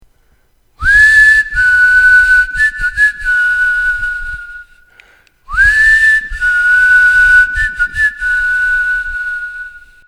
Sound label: Whistle